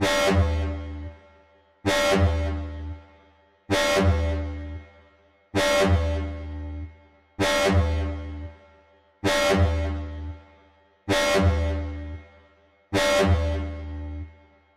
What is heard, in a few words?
Notification Pop Up